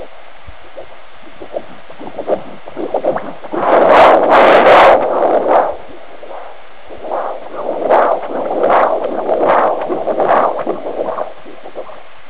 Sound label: Air Doppler